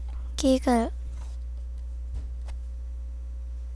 giggle

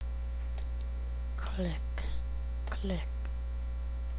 click

